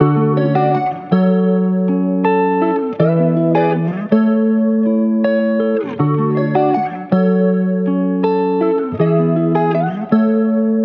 160 BPM Fm; JustFriends

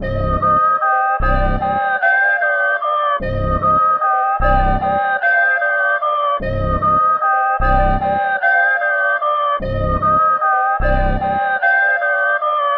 150 BPM Cm; Hangout

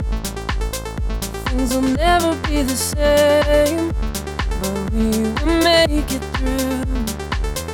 Full Beat House

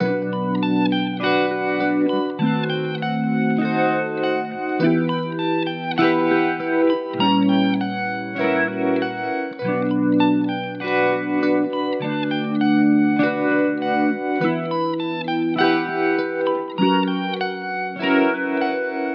100 BPM Bm; Destination

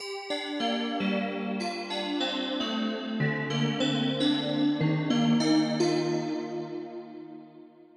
Melody Pluck Future Bass; midi wizard 2; Unison

